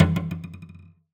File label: Cello D Bass